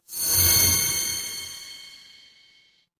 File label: magic scifi heal